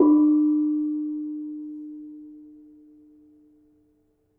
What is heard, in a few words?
Ketuk, gamelan; gravityharps; timbrebox